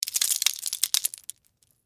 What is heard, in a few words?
Texture, Debris, Spill, Sugar, Cone, Waffle; SoundEffects-BlastWave